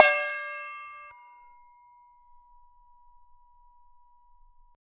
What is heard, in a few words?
hammer anvil impact, blacksmith